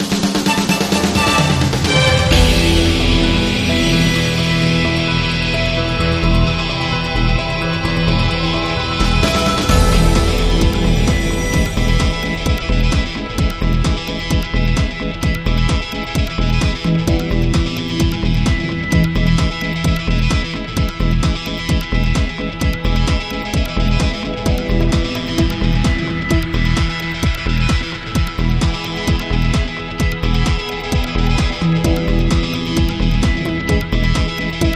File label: Latest News Talkover